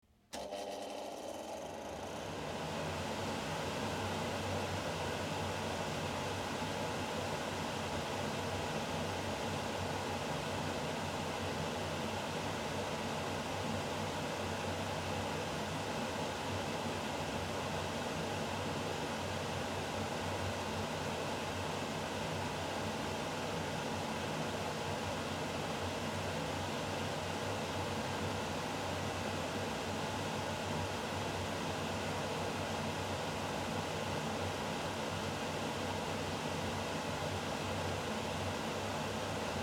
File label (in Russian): vyt; neff